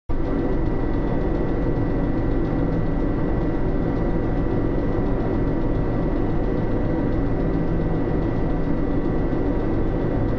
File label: ambient; Marc Ecko's Getting Up: Contents Under Pressure; XBOX